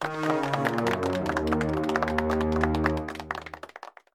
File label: fanfare lose; Wacky Races - Sling Races; NextlevelGames